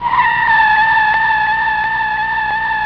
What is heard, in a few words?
SLIP